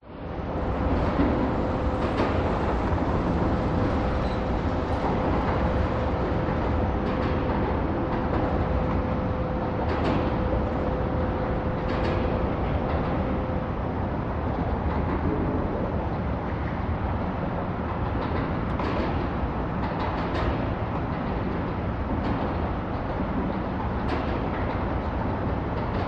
city traffic over sydney harbour bridge recorded underneath bangs; public places; rattles